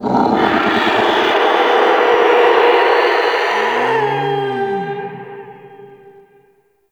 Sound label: Ext Dist Animal, Ambience